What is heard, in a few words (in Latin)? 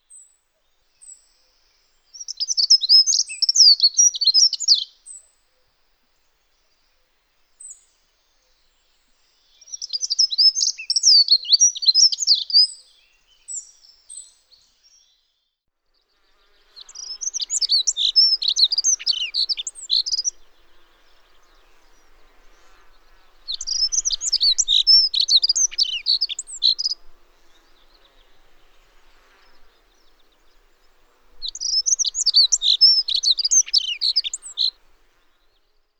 Prunella modularis